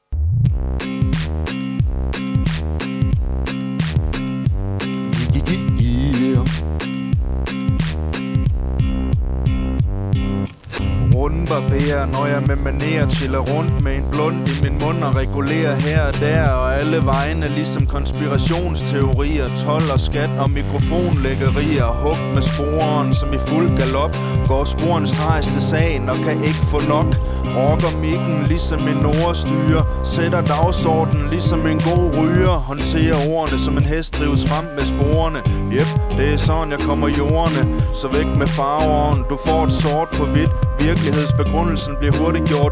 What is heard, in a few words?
rap